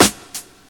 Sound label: 2000s hip hop snare drum g sharp key, steel snare drum sound tuned to the G# note